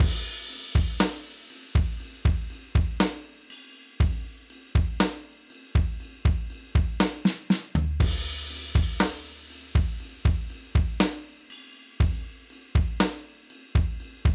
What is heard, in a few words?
Drums